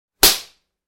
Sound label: Face Slap; Hollywood Edge Martial Arts and Human Impacts